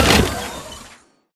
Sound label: Sentry Turret Leg Servo; Boost Card; Titanfall 2